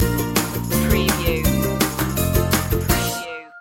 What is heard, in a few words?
kids in the sun stinger